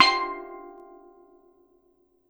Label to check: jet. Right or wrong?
Wrong